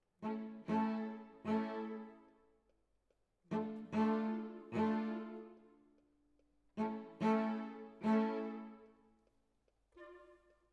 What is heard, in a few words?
Cello Solo